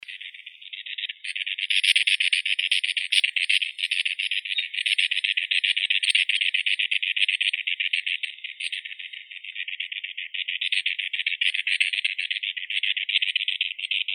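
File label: common ringed plover, Charadrius hiaticula; galstuchnik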